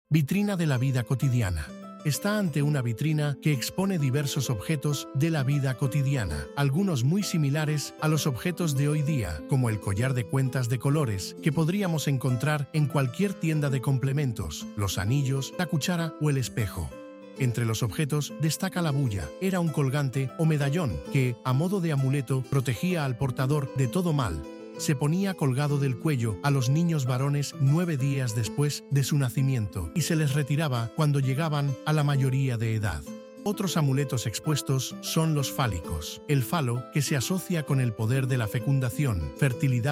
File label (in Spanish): audioguia música